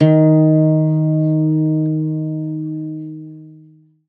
ZITHER, Alpine Volksmusik; Best Service

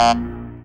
SYN TAKE, Dance Synth; Sound & Vision - Gigapack I CD 2

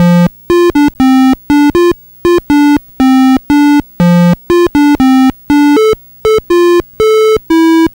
Atari 2600 Synth

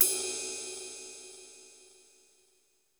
Ride, Roland JV 1080, Drums

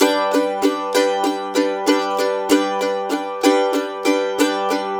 Blues Guitar Samples, RIFF; ILIO; Fretworks; 90's Sample CDs

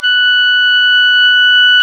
OBOE

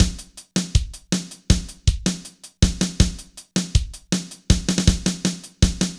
BPM 80 AMB

